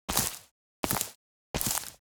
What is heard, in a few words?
walk meadow